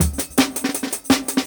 Jungle Breaks; 90s Sample CDs; Original Jungle Breaks

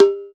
Zir, Percussion; Roland BK-5

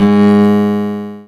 c#5, Contra Bass, AKAI S-612 + S-900; Retro Sampler

